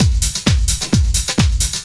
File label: drum 130 groove; Techno Trance Essentials